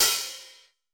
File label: hat open; Greytsounds; Scott Peers Sound Engineering